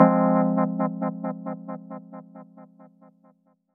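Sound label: Fm chord; Saivorz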